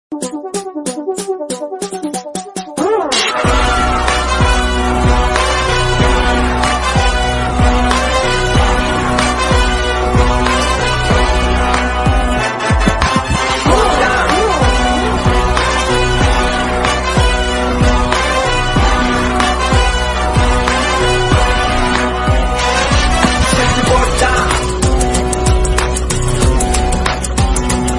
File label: Bgm Tamil Ringtone; Vikram Sketch